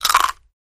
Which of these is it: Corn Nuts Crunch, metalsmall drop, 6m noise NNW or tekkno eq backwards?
Corn Nuts Crunch